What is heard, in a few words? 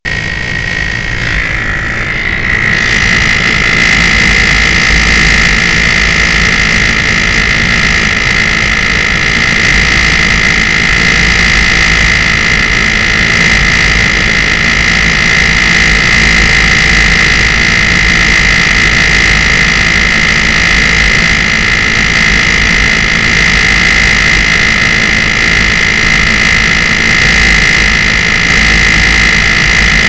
saab noise